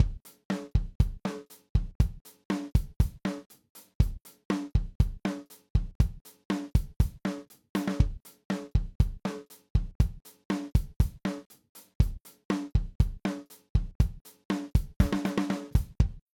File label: sampled drums unimpressive